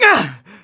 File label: Damage Fly; SSB Brawl; Link